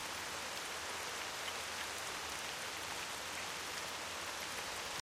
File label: Amb Rain